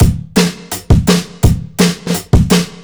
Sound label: BREAK; astrophonica; SULLY